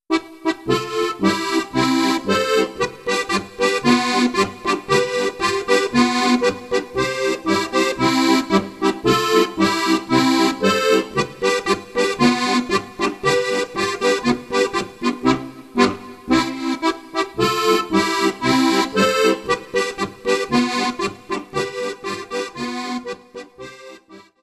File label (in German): polka